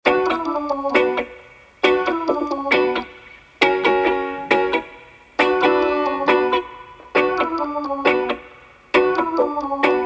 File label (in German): Violin ohne horn